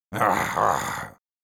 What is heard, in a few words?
Sniper negative vocalization, es; Nrgh, Arrggghh...